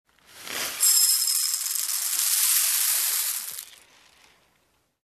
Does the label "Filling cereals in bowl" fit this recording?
Yes